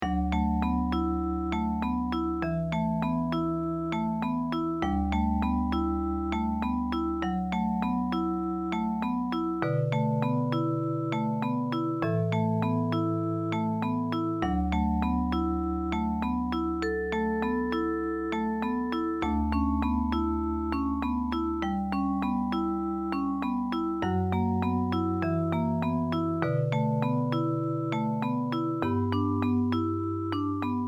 short melodies melody of far longing

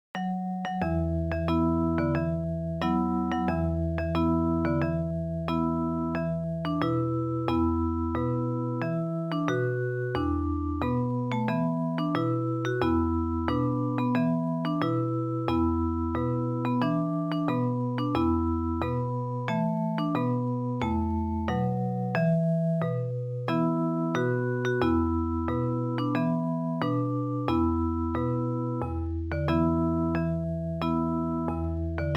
japanese folk song; dekansho bushi

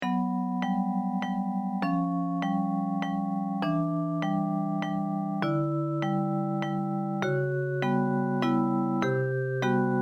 simple waltz

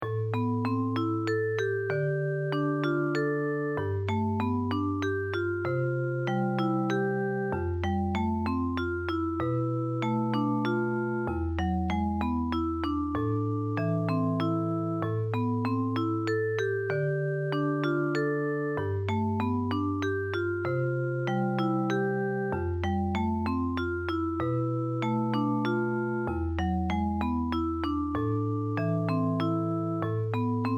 short melodies romantic melody

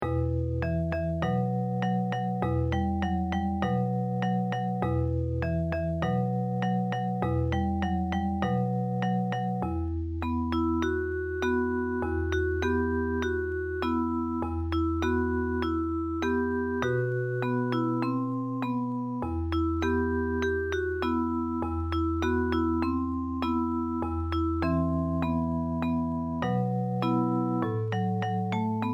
japanese folk song; yosakoi bushi

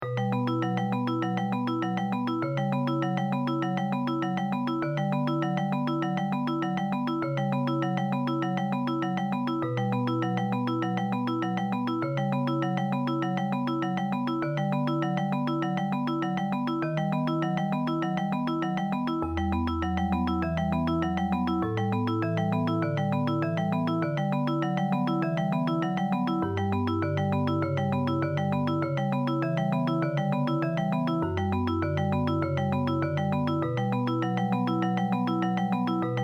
arpegio estudio in b minor

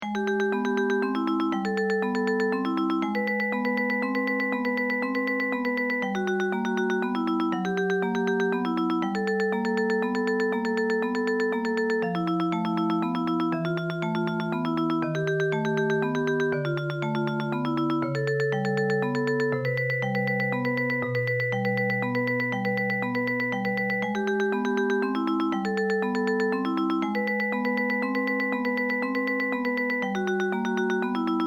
darkness tremolo

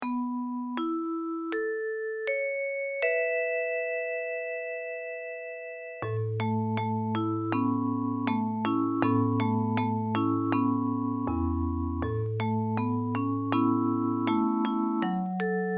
island songs; makurakura

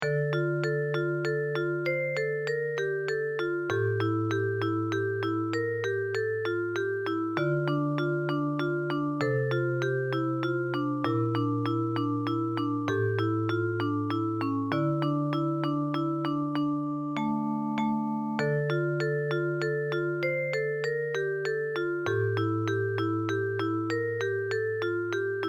short melodies nostalgic melody

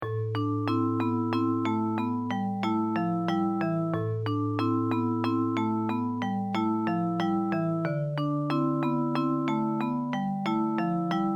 simple waltz

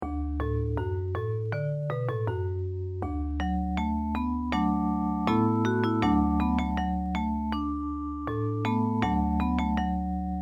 japanese folk song; takeno shintaro san

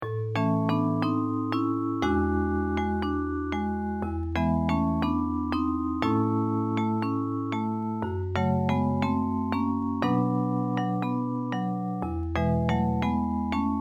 tango tropical

